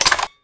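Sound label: crossbow clip out fidget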